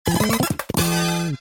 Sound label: course clear